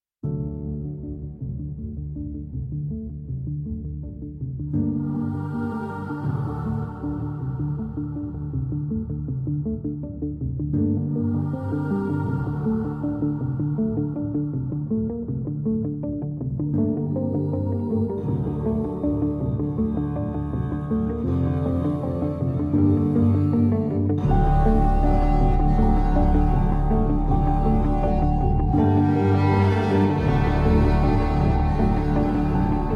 Instrumental

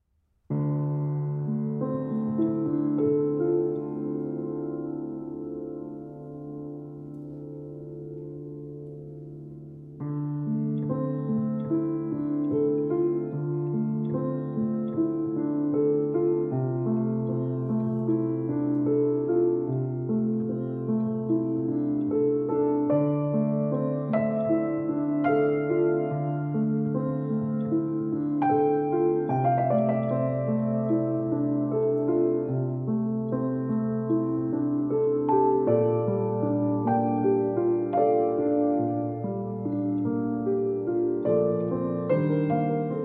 Piano Version

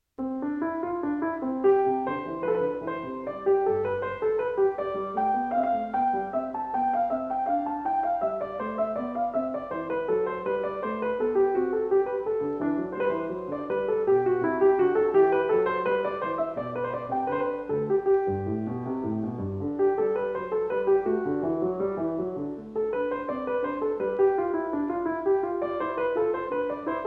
Invention In C

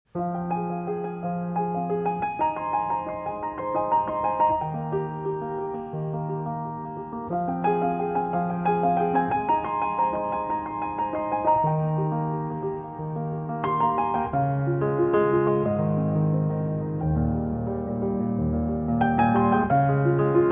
Piano Allegretto

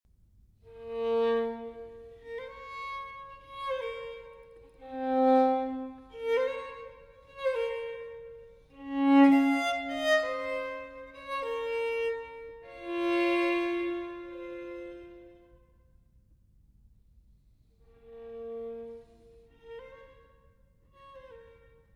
Solo Violin in D Major Largo; No. 10; TWV 4023; Telemann Fantasia

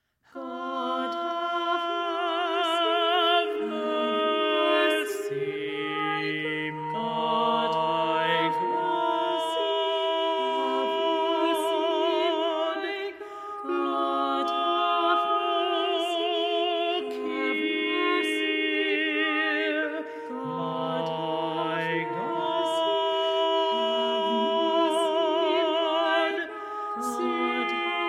4 Voices & Percussion; No. 9, Have Mercy, My God

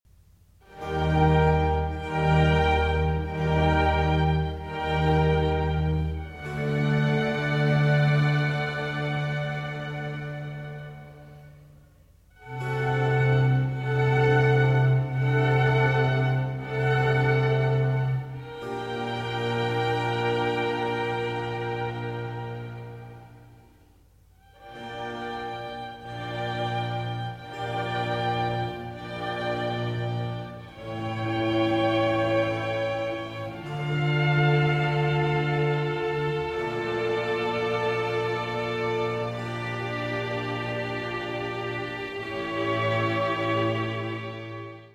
Concerto No. 7 in F Major for 4 Violins

